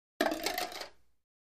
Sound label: Misc. Sports Elements; Filling Ice Into Water Bottle.